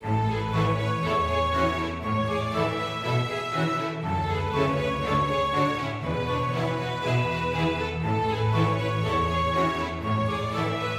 happy forte; Quivr; PS4 Windows gamerip 2016

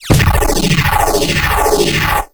Boss Attack

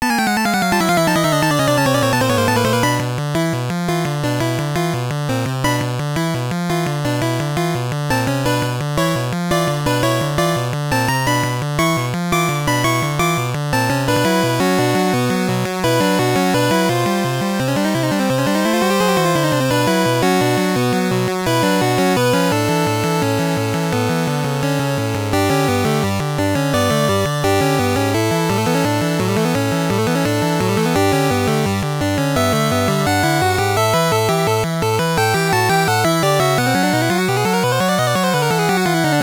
Battle (VS Trainer); Pocket Monsters Midori; SGB

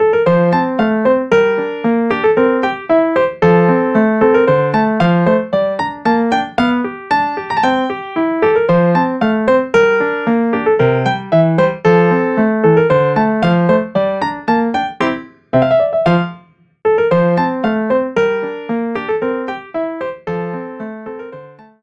Primeval Jungle (Piano Ver.); CookieRun Sweet Escape Adventure

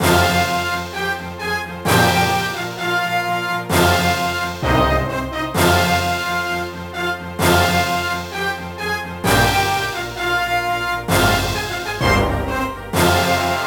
BGM ORCHESTRA

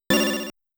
Hit Sound; Nabicon; Motos

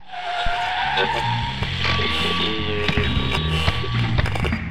tutorial transition; Get in the Car, Loser

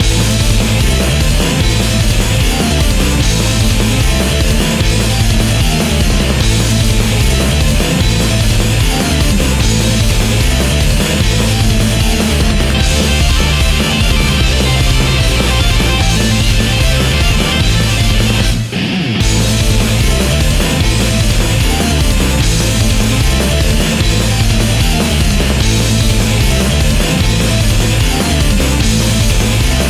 High Speed Heavy Battle

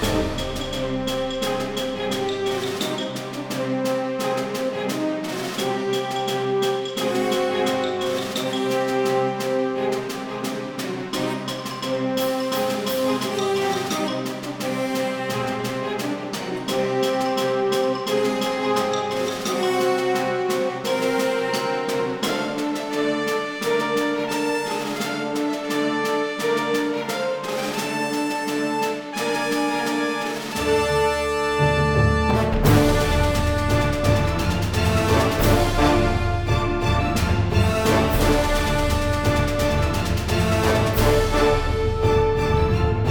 Music Failing Defenses; Dungeon Punks; ps vita ps4 windows xbox one

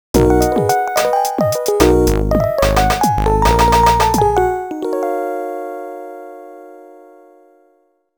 School (Jingle); thats so raven psychic on the scene

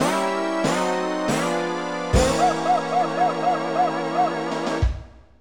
5th-8th Place Fanfare; Mario Kart 64

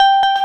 KEY Hold Start

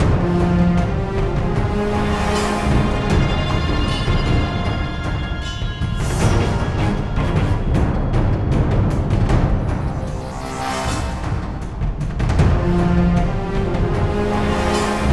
Combat; wild blood android ios gamerip 2012

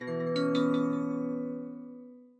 Harp; Harpman; Chapter1 Trollcaves

